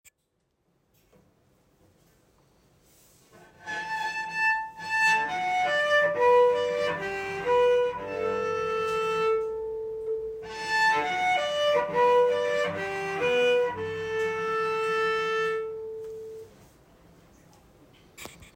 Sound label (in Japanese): 弱めハイポジ, cello